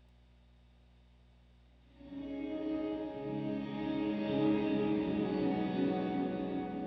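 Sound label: square drone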